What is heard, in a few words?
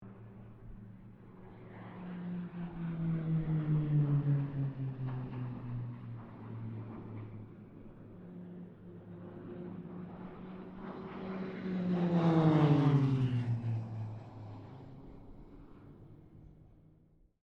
AIRCRAFT PC-9A SQUADRON 5 PASS DISTANT, air display; Roulettes; Airplanes